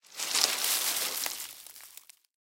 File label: tree untwist; tanks; War Thunder